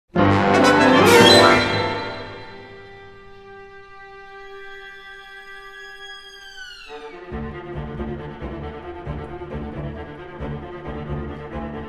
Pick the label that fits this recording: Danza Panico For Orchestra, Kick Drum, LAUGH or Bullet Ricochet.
Danza Panico For Orchestra